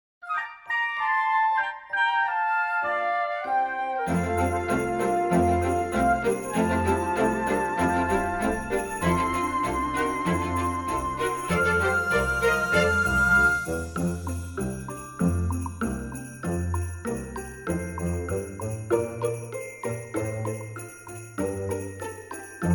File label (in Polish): wersja instr